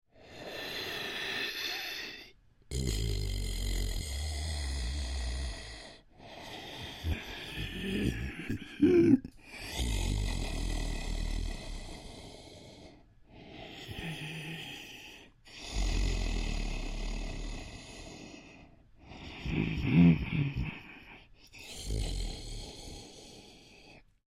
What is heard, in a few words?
Creature Troll Breathing Calm; Monsters; Horror; Big Room Sound